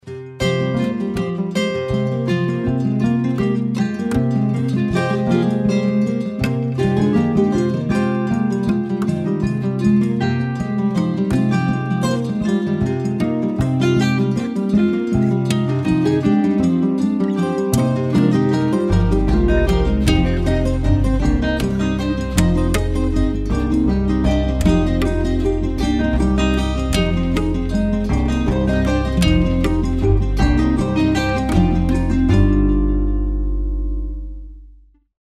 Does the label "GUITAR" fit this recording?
Yes